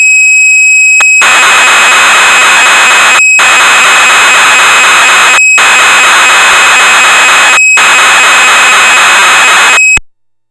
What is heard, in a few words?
SX210 Bank D, Kawai Teisco